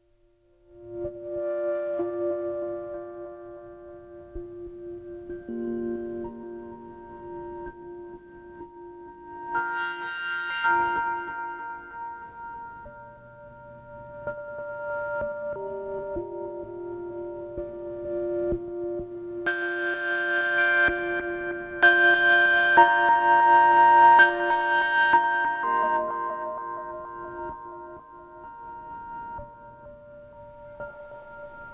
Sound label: blissed ambiance